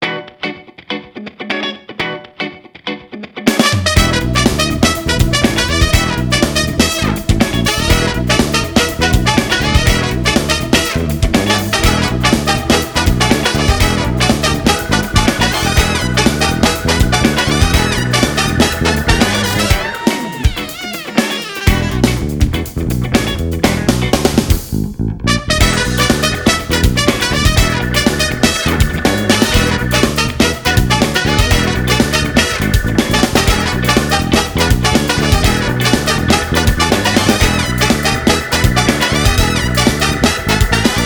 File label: Music Country Club; Game Party: In Motion; Xbox 360